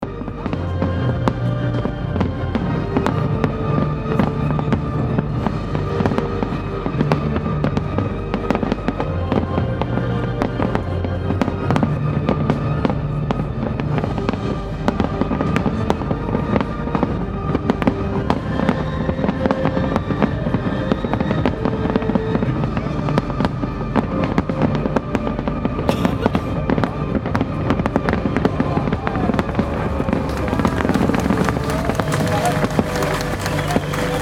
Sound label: paris fireworks; Fireworks, Champs de Mars, Paris.